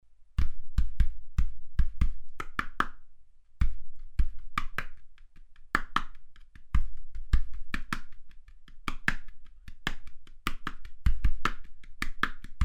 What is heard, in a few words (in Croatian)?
bubanj